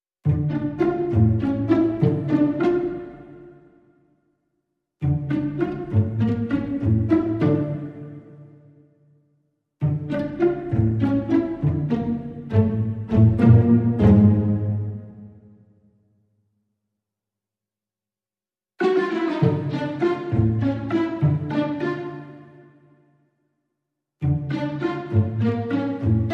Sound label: Strings Only